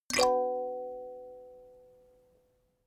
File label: notification kalimba slide minor